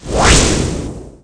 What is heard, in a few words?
launch